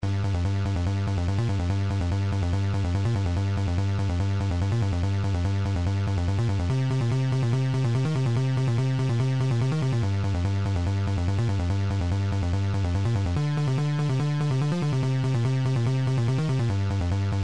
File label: level music; nes kung fu